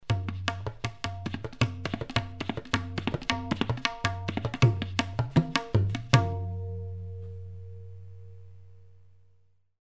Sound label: Tabla solo